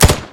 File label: Deagle